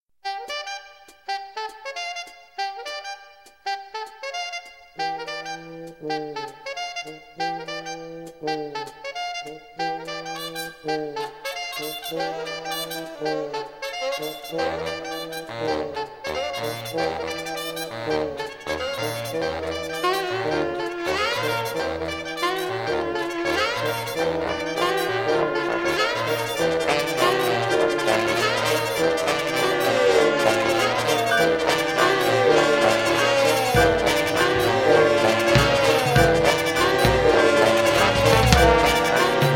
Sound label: jazz orchestra